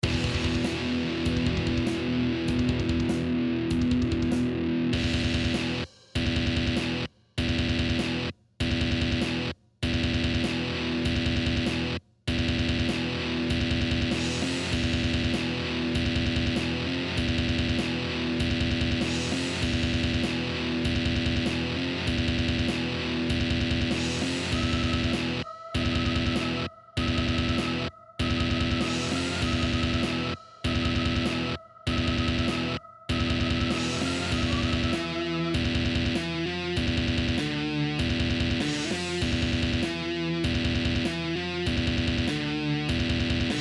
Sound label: Riff, guitar